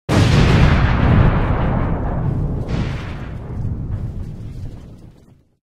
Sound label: explosion sound